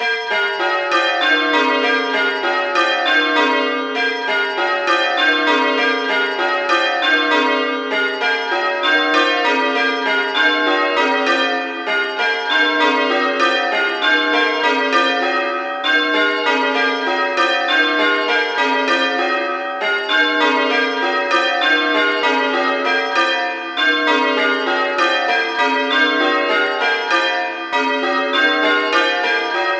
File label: old style six; cccbr